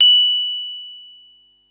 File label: mallets